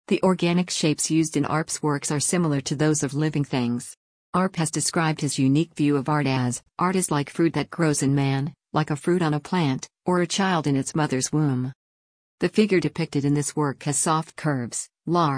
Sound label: Arp